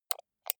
computer mouse right click slow

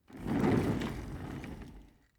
office chair trundle fast long

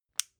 flashlight push in

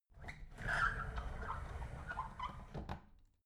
drawer big squeak close soft

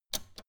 old button simple press fast

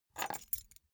key ring small grab table

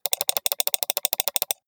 computer mouse click sequence steady

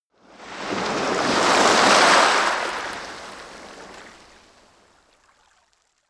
coast oneshot surf soft

